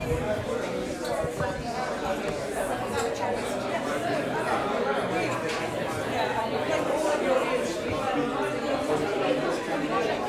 Ambience Room Interior Party No Music Loud Chatter Light Natural Reverb